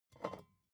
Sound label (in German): bottle vodka pick up, gastronomie